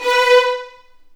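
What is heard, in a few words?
B4 violins; The Ultimate String Collection; East West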